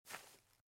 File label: SFX step grass; out of reach treasure royale 2020 gamerip pc